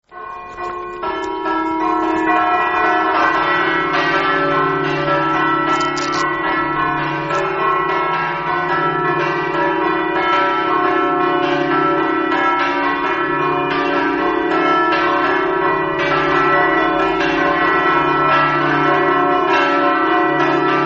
mereto, campane

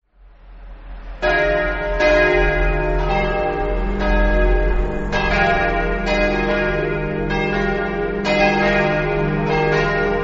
vidulis, campane

